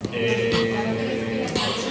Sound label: emmmmm